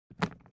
foley briefcase plastic pick up hard; smartsoundfx

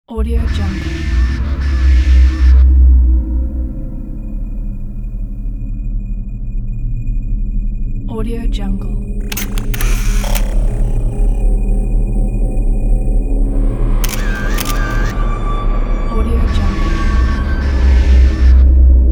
robot movie intro

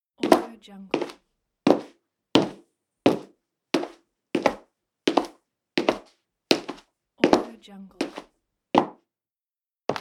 footsteps on concrete

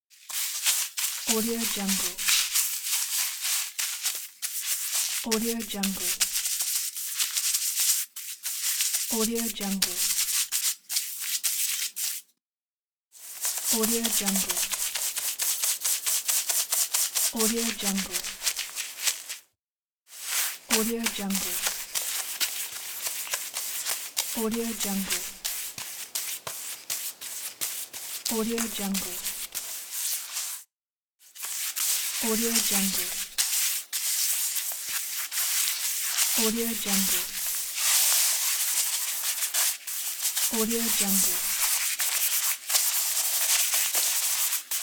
paint brush

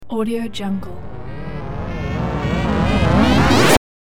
gather magic spell; sonic-boom

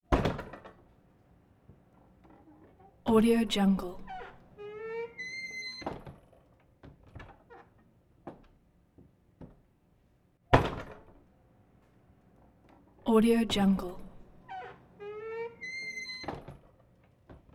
wood swinging door squeaky loud solid impact; prosoundfx

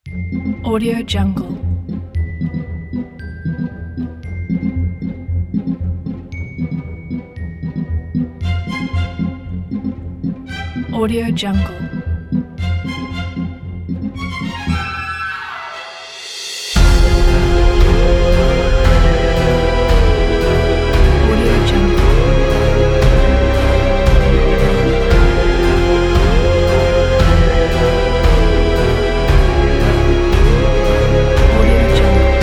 spooky fun halloween

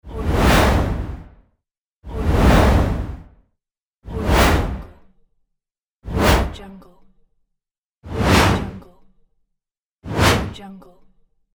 whoosh

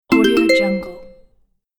kids game correct

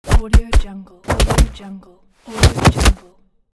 kick

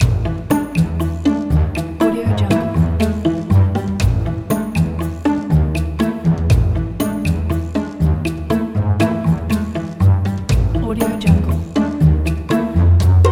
pizzicato comedy loop; andrewstaller

